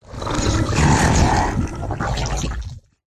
horror monster swamp growl slimy wet

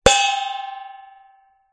impact metal bowl resonate; zapsplat

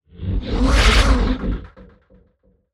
Whooshes Impacts Whoosh; soundbits

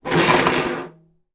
small metal case tin push slide concrete; foley; zapsplat

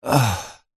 male middle aged exhale sigh moan; human; zapsplat

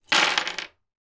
game metal playing pieces drop on table; monopoly

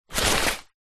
wetsuit top pick up; foley; zapsplat

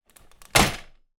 household bed z bed folded up roll into wall

